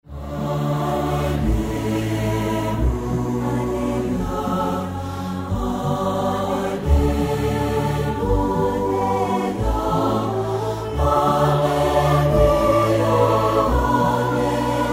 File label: Canon In D